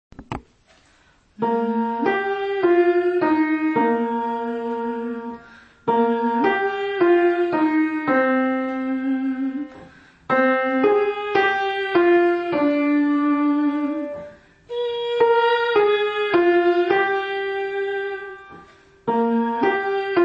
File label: Jingle Bells, choir